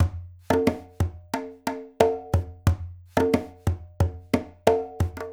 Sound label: Delayed C; Сэмплы ударных (Легкая музыка); 90BPM; Beat; Drums; Easy Listening; loop; Ударные